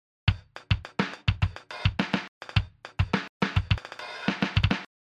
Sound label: break beats